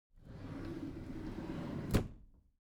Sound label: object drawer packed smooth open soft